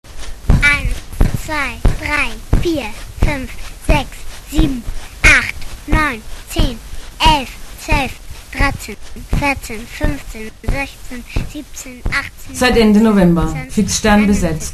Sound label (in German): Jingle; Fixstern